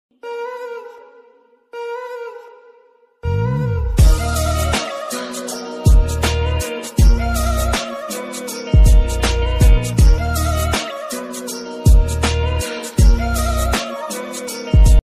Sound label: Punjabi hip hop ringtone